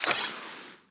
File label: knife draw reload